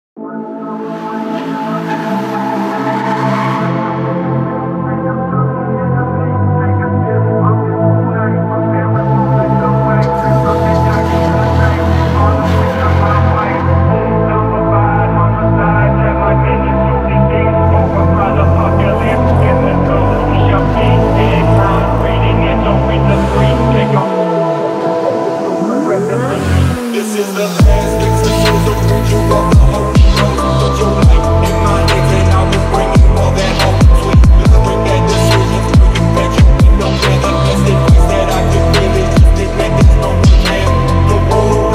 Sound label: chillphonk